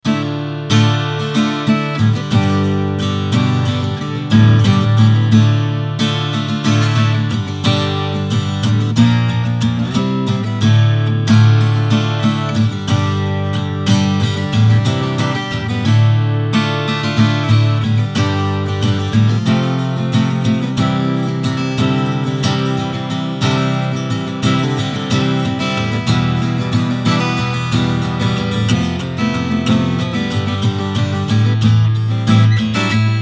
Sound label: Strumming SM57